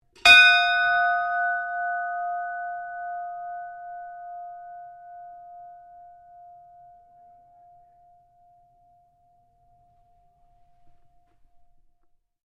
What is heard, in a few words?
SHIPS BELL RING; Hmas Castlemaine 1942; Various ships bells; Boat Sound Effects; Boats (Sound Effects:Vehicles)